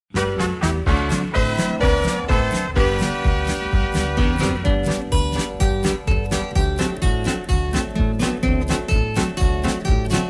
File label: harmony